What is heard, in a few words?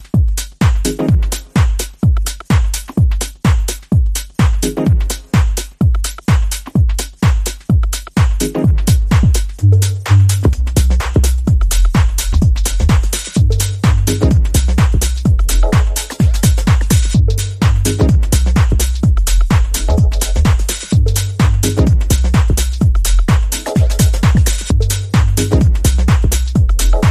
Artistic Techno Rhythm